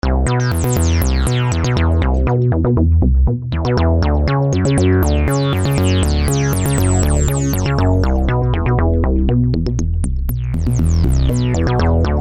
Dual TB, V-Synth

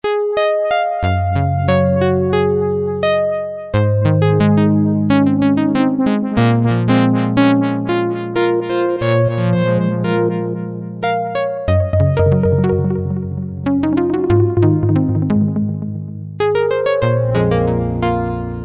Dreamy Sine; V-Synth

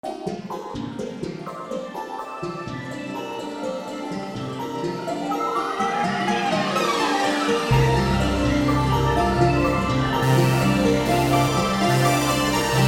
Stacking 5th, V-Synth

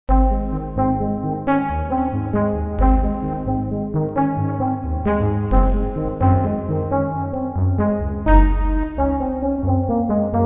Cottonballs, V-Synth

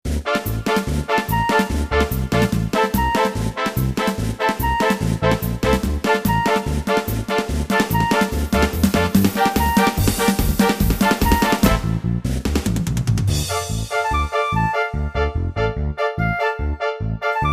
Banda; LatinAmerica

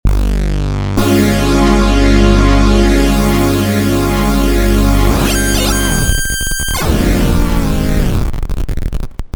Jp8 Brass, V-Synth

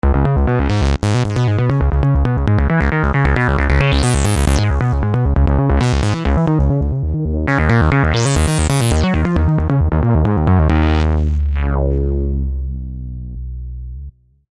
Kick Bass, V-Synth

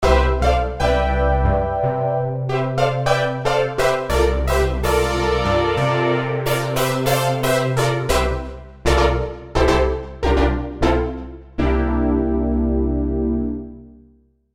Jupiter, v-synth

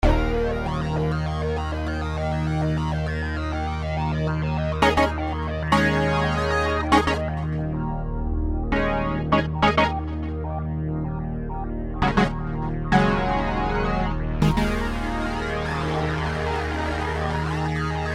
SH Formant; V-Synth

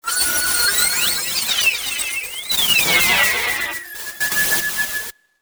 Metavoice, V-Synth

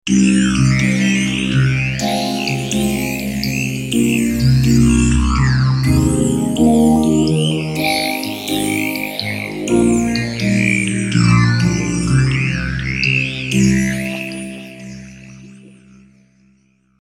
Male Doo Pluck, V-Synth

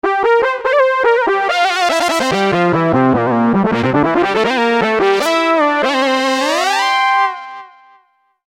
Lead, v-synth

